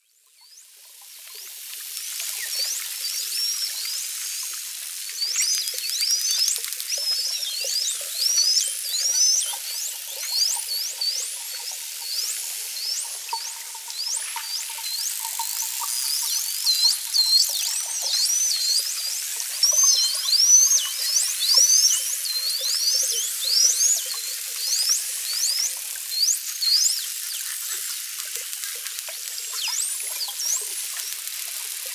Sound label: Bottlenose Dolphins